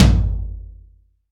roomy tom sample g sharp key

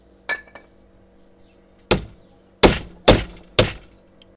hammer drop and hits

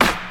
00s short hip hop steel snare drum sample g key

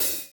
short shaker a sharp key; percussion

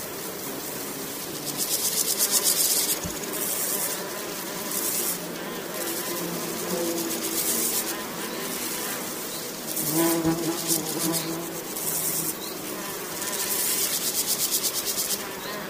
grasshoppers, brush crickets insects sound; soundscapes

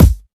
shiny kick drum g key

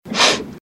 SNOBBING BIG, people

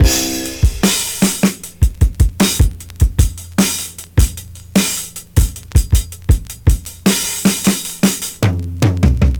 103 bpm drum beat c key

